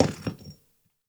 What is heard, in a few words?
lrg rock softland concrete; 3 Leaf Productions - Audio Pack; rock-wood sfx(mini pack)